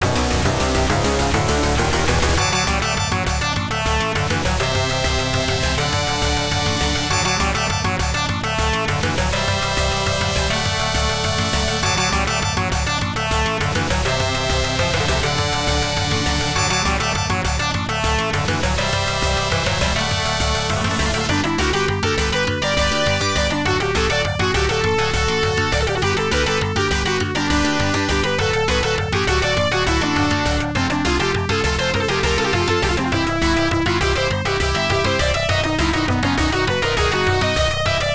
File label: Fight; King Salmon; Genesis